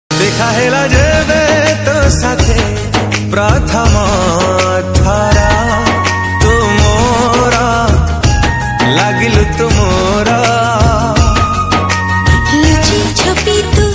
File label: Odia Song Ringtone